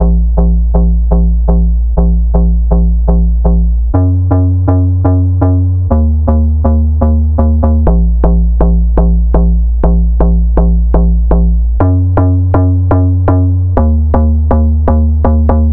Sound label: Donk Bassline, 122bpm A#min; Construction Kit; Ghosthack; Summer Freebie; Acapella Kit